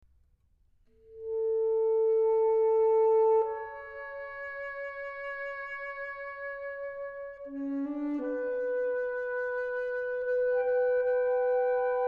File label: saxophone quartet; Lyrics (3) for saxophone quartet